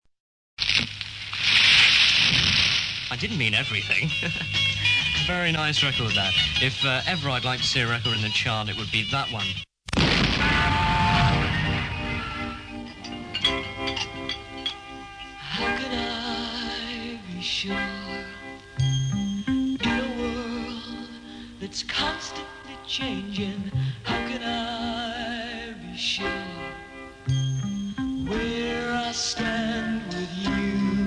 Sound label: jingles; horne 72